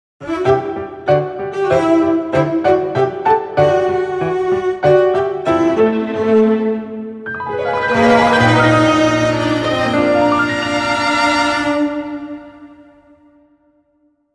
Skill Learning Jingle; bayonetta origins cereza and the lost demon; Never Enough Snacks; Cheshire

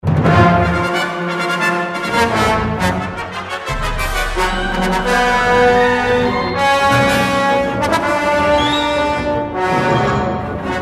action; Bespin Platform; Star Wars Jedi Knight II Jedi Outcast

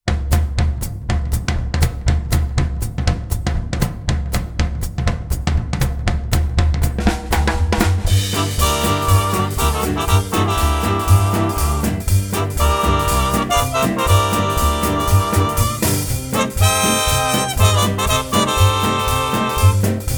Jazz; Scape

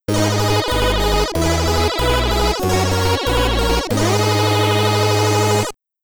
Stage Clear!; Eskimo Games; commodore 64

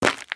Cave Footstep; The Inn

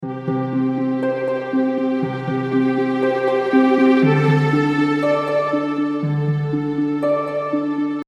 Ready To Play Loop; Kahoot

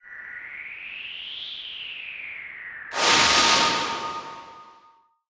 shine disappear; natsume

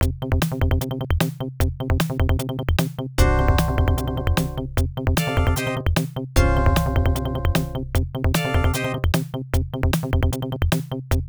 Goal; super sprint; atari system 2; arcade

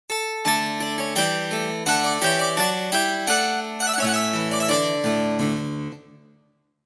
England Music; Civilization Revolution; Xbox

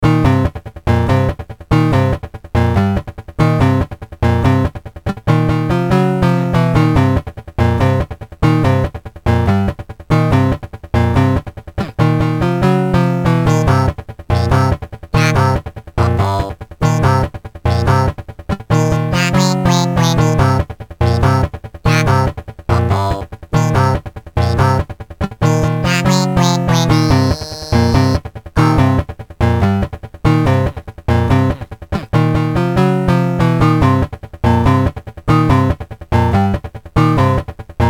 Metal; Animal Crossing Wild World

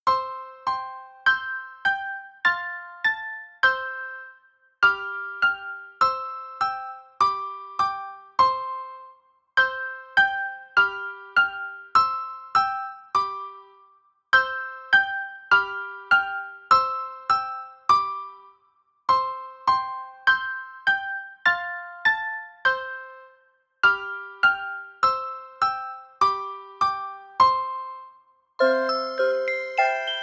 Twinkle; windows gamerip 1999; Babyz

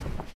Footstep Carpet; this is the zodiac speaking 2020 gamerip switch ps4 xbox one pc macos

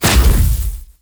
Electric Ball Hit; god awe full clicker 2018 gamerip

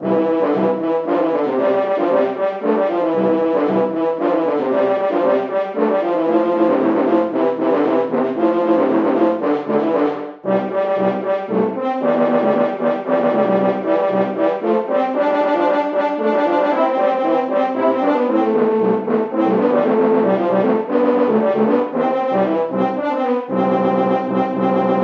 Horn; The Bard's Tale Remastered Trilogy

